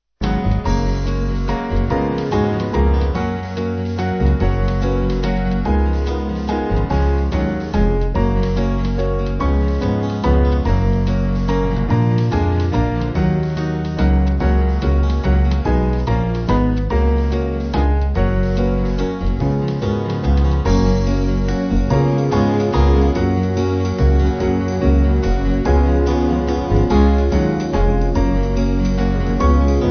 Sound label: Hymn Band; There Is A Name